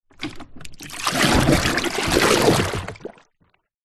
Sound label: household bucket plastic submerge deep water